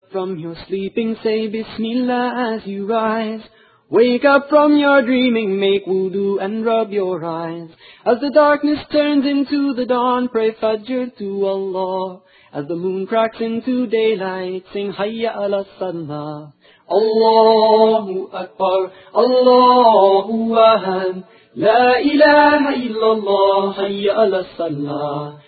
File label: Prayer; ringtones